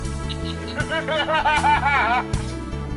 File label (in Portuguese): risada